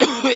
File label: cough